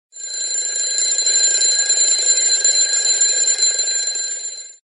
htc classic sms ringtone

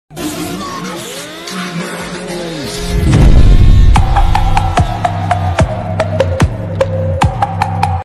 Animal Racing Mix Ringtone

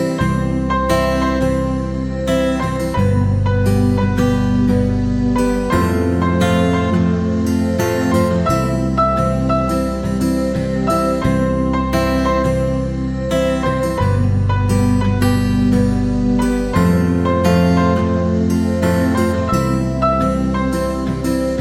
Oppo Ringtone

